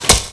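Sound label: archery hits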